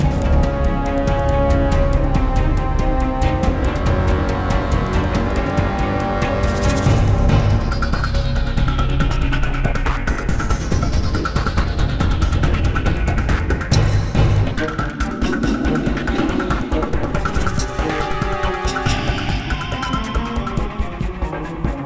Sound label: Action Boat